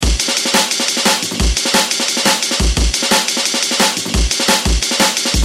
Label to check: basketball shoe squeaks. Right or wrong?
Wrong